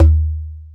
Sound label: Quinto Bass Tone; Planet Earth; Digital Sound Factory Planet Earth